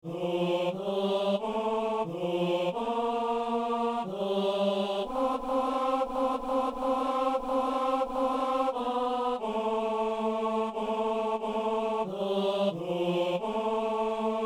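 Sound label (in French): messe agnus ténor; messe de saint jean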